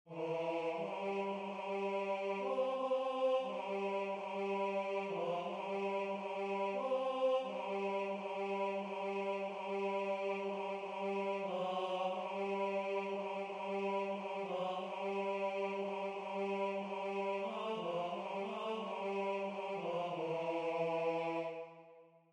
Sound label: Tenor